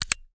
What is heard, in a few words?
Button Wall; Game rip; MiSide